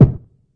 classic hip hop kick; STYLE KITS; Classic Hip Hop Mega Kit

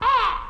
silly elec fx; UNUSUAL KITS and FX; Silly Electro FX Kit

